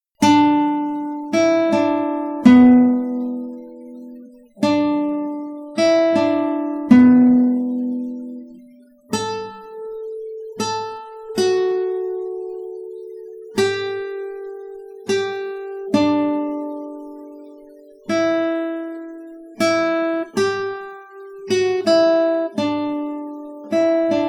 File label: silent Night guitar